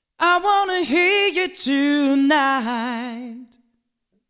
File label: Voc Comp EQ